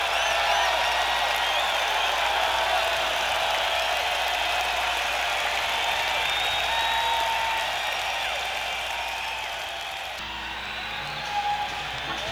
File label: Encore Break; senn421